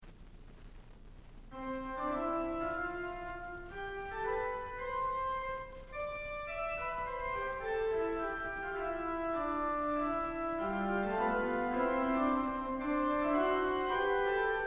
Fugue in C, organ; postludes

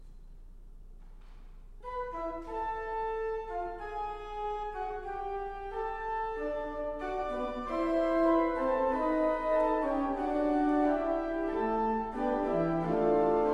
Fugue, organ; Jean Weininger; voluntaries

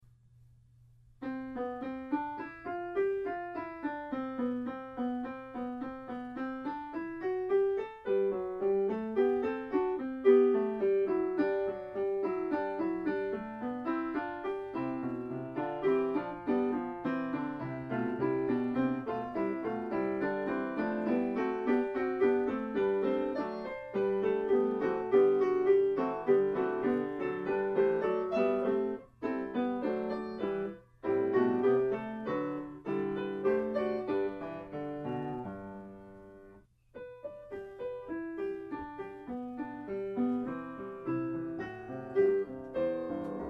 Figure Skating Fugue, piano; Three Funny Fugues

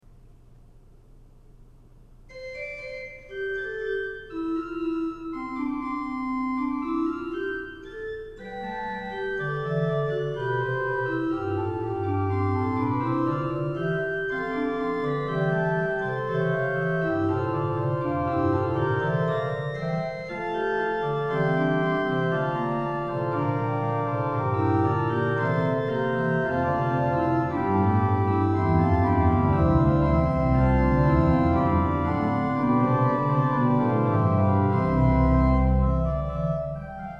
Fugue, organ; Dippy Egg Fugue; voluntaries

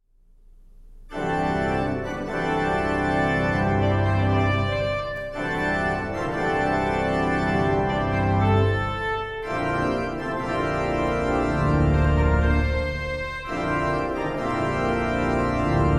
Fanfare, organ; Fanfare No 8

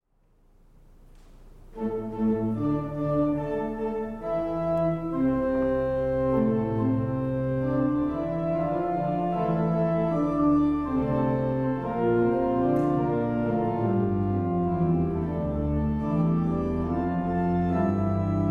organ; A Late Birthday Gift; voluntaries

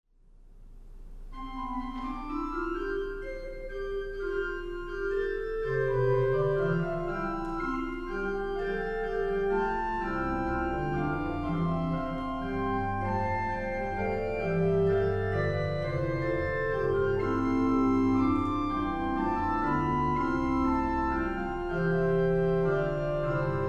Little Trio, organ

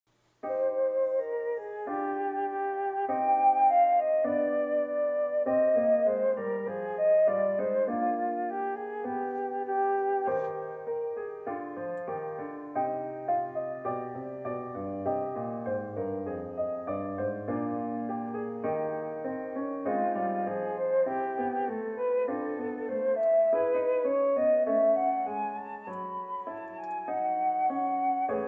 Sad Little Song, flute

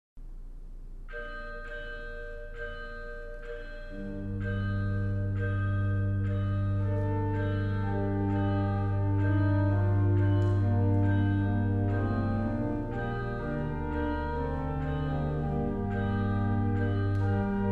organ; Das Lied der Glocke (Bunk); Arrangements; St. Joseph of Cupertino Organ

